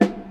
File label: Clean Acoustic Snare Sample F# Key, steel snare drum sound